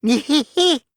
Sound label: nehehe; kokichi